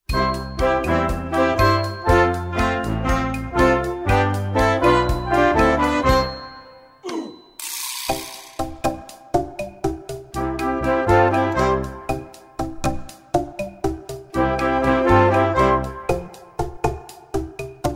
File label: Tropical Boomwhackers; solowerk